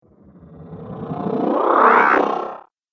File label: Electroid Whoosh Slow